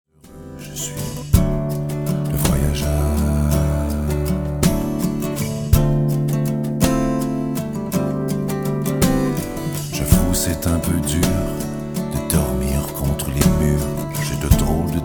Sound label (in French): guitar voix